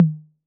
Tom Mid B 808, Clean, Digital

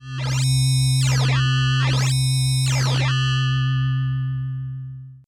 Robot Movezzz

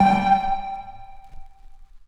Prodigy Dark Pluck Verb Vinyl Synths G2; vinyl keys

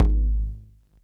Udu Color

